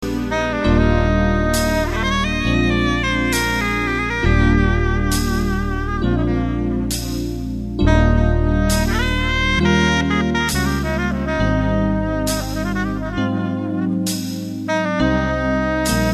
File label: Xmas Song Tenor, sax